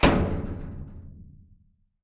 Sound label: Dumpster Hit